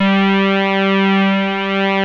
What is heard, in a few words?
OSCAR G3, synthesizer; Hollywood Edge - Giorgio Moroder Rare Synthesizer Collection